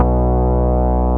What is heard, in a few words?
BAS Mini; Best Service Gigapack 2